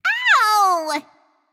ouch; smite; scylla

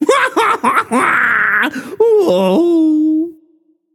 boohoo, voicepack; smite; jorm

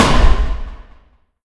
airdisc impact; smite; kukulkan

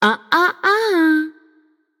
nv disagree; smite; voicepack; morgan le fay

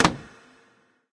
click; smite; chronos

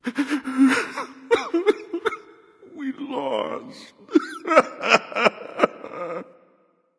boohoo; smite; poseidon

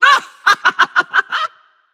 yay; smite; morganlefay

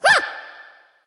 grunt jump; smite; thoth

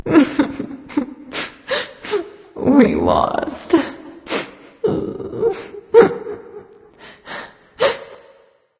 boo hoo; smite

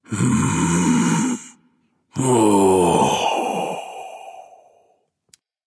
nv breath; smite; voicepack; xbalanque

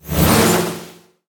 swing; smite; chronos

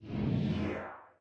swish; smite; god thanatos

